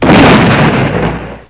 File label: thunder; scuba